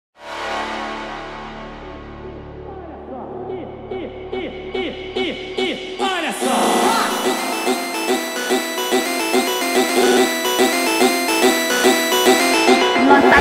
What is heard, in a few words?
funk sped up